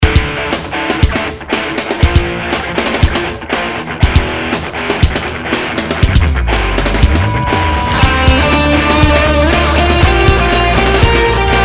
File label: guitar